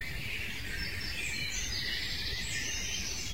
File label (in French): chants d oiseaux